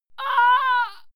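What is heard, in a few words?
female fight pain, screams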